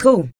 VOX, VOCALS; Ueberschall; Jam Box

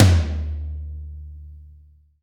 TOM, Drum Dry; East Collexion; Drum 1

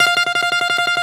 BALALAIKA, Prima Balalaika, Plucked Strings

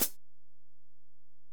HiHat, Alesis DM-PRO

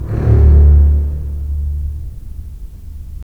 STR, Full Short; Roland - LA Composer vol. 4

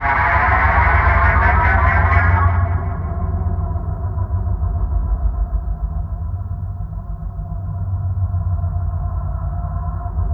SCANNER; Best Service Advanced Media Trax Building Blocks; WAR GAMES

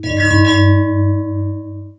Criket Fill, Esoterik Beatz; Criket FX; Cricket

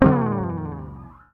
SLIDE, Bass; Sampleheads; Will Lee Bass Library

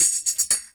LOOPS

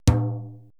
Procussion Mix, EMU E-III; Retro Sampler

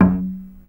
GAMBANG F3; Spectrasonics; Heart of Asia

